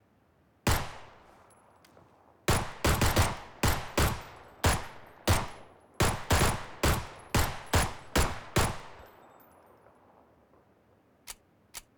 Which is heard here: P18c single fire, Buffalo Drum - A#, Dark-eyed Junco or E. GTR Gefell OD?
P18c single fire